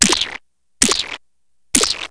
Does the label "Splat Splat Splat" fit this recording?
Yes